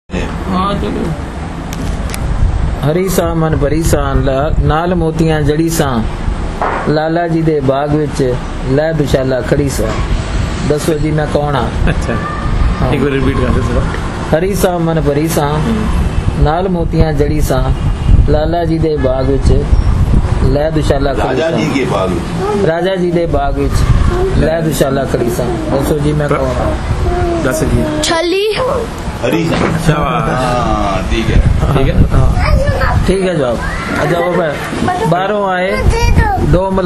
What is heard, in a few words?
Punjabi